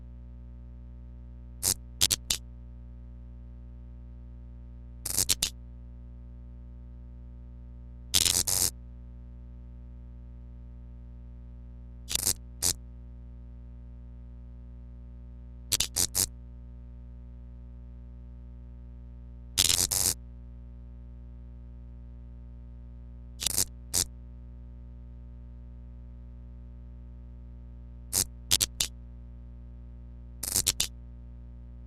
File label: light zap; Pitch Black - A Detective's Demise